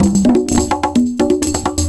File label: PERCS, 70 s Breakbeats